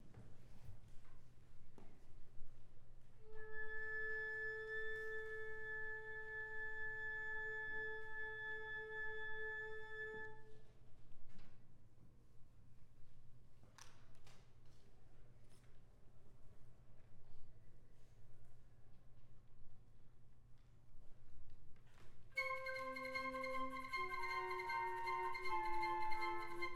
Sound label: Flute choir, Orchestra; CMU All University Orchestra; Spring 2005 Concert; The Comedians' Gallop